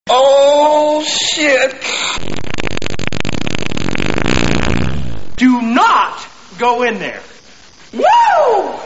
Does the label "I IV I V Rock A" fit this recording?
No